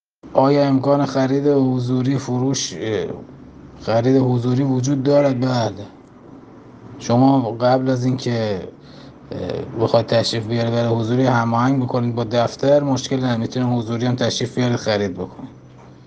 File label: audio traditional